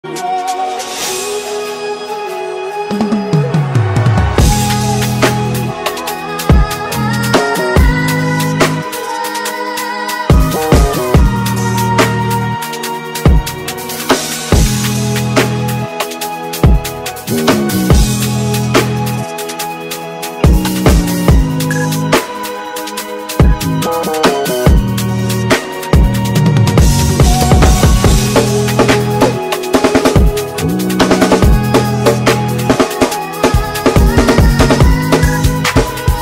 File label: drum cover